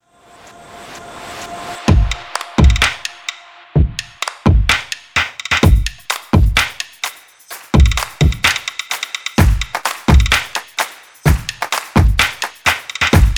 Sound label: DANCE OFF 128bpm